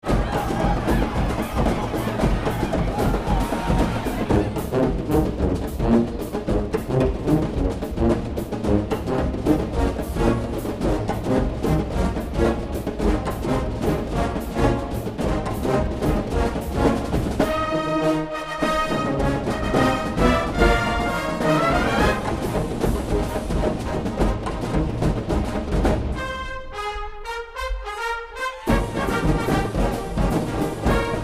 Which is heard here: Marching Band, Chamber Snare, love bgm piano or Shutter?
Marching Band